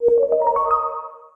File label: wind